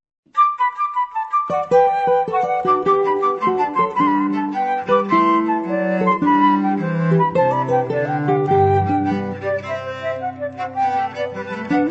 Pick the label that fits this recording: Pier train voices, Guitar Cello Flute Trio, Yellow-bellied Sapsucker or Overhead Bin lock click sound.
Guitar Cello Flute Trio